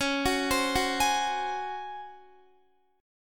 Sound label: C sharp Major 7th Suspended 4th C sharp Guitar; C#M7sus4 arpeggiated; JGuitar